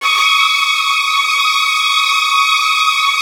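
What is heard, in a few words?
violin trills; Virtuoso Strings; ILIO; Kirk Hunter Virtuoso Strings VOL-1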